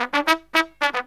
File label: TRUMP, Killer Brass Riffs